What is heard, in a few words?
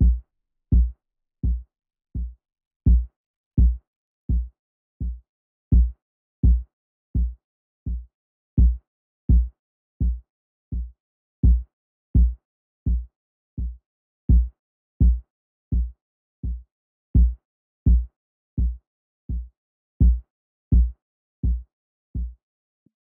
168 BPM F# Min Kick; Loop Stems; Cymatics; Infinity Melody Collection; Pretender